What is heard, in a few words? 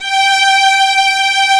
VIOLINS G3; E-MU Formula 4000 Series Vol. 5; Protozoa; Vintage Presets